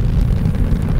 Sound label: flamethrower End Fire; RainbowThrower